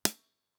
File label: Hats, One Shots; Touch Loops; CosmicFunk